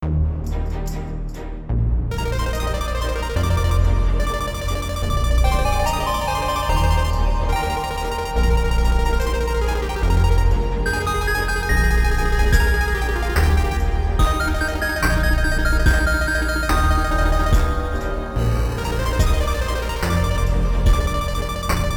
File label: chiptune looped; Tchaikovsky